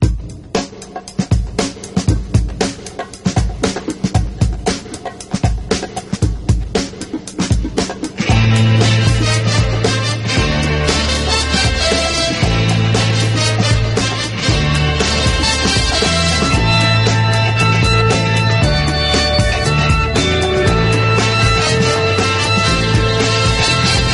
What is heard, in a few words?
bongo full